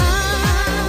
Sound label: ahhhh